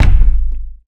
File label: Kick, Kicks